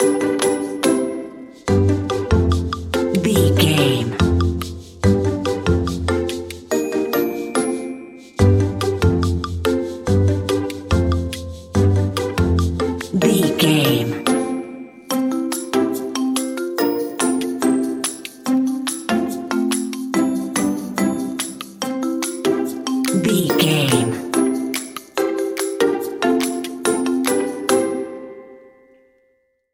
hap hap clappy

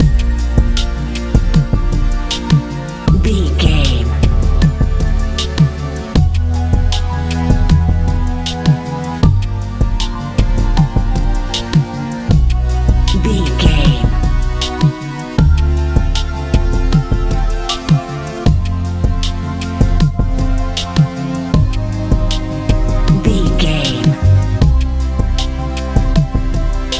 hip hop

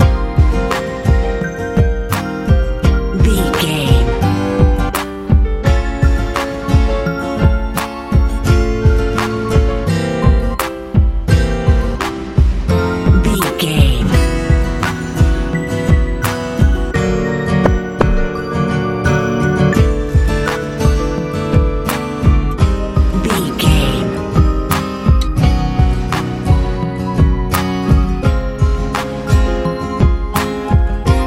sunny nice swirl big dreams techno

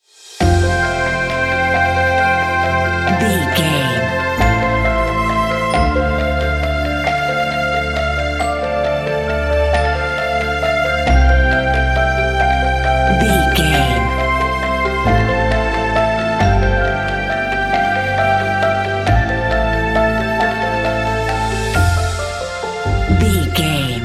sentimental uplifting piano and strings

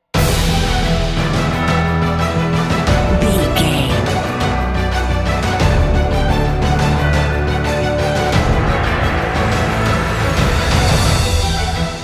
epic cinematic

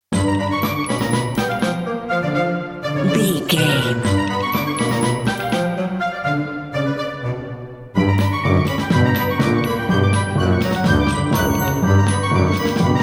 zany overture bumper

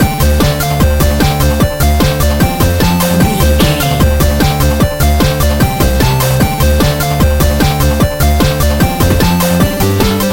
retro 8 bit game stage loop

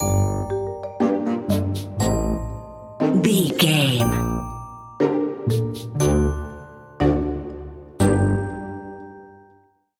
sneaky tiptoeing stinger

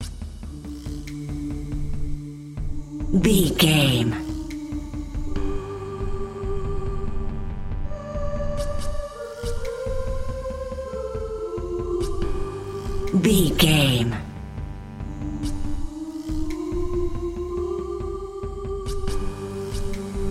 sci fi pack song mission in the void

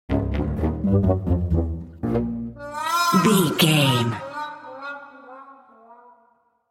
cartoon

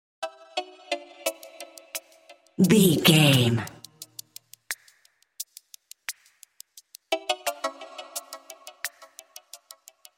plucky curiosity

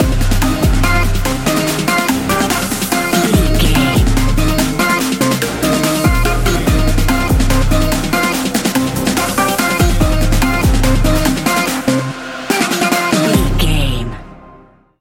high speed vessel party

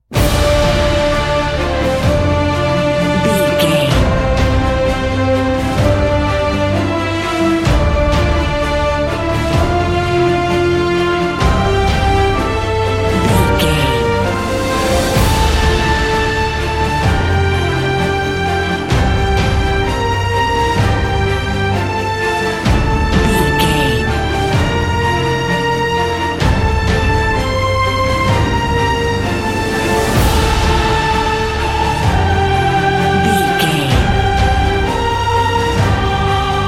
awakened epic cinematic

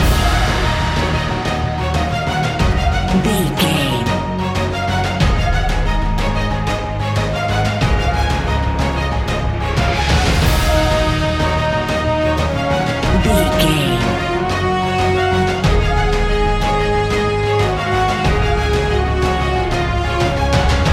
epic cinematic loop

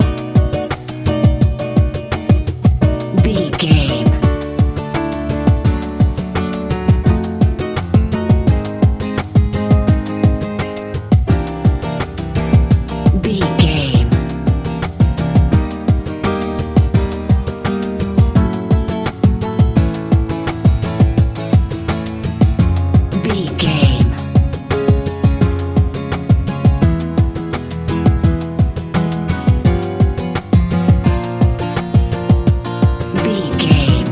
colorful nice chillout pop

